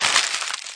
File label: wrap paper; curiousgeorge